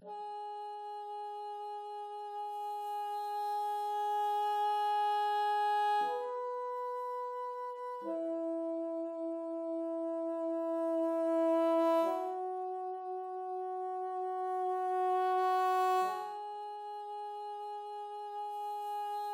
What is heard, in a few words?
Rnd Melody; Arrival Asylum Long Gm; tintin reporter cigars of the pharaoh